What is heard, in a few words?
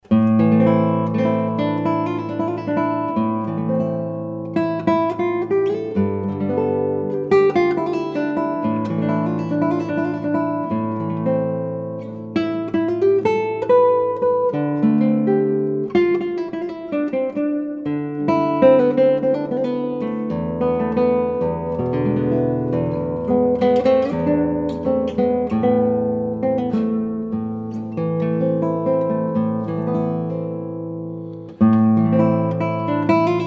Solo Guitar